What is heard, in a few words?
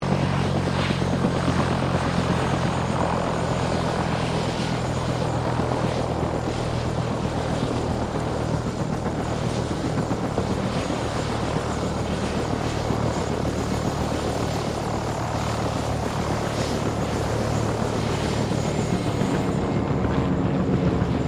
HELICOPTER BELL UH1 IROQUIOS LAND; RAN UH-1 Iroguios; Helicopters (Sound Effects:Vehicles)